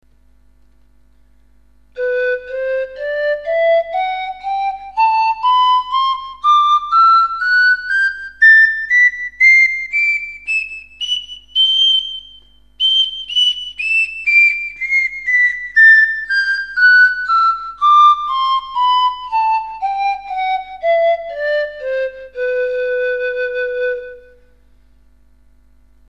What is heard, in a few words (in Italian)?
soprano